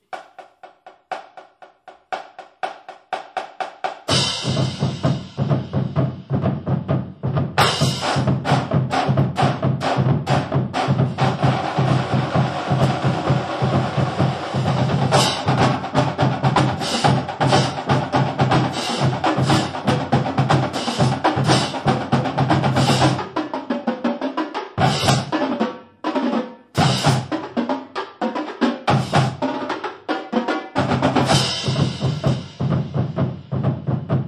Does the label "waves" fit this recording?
No